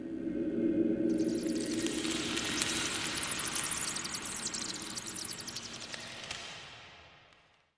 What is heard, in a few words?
Cave Entrance Bats Rocks Doom